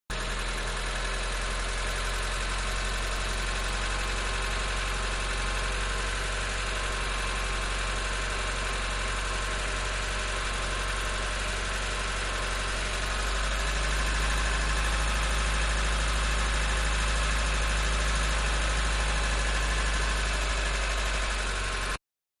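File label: Small Car Idle Exterior; Cars; Vehicles; Manhattan Production Music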